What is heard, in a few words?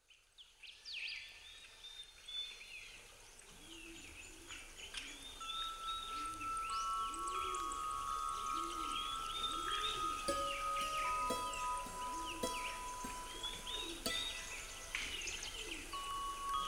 healing bells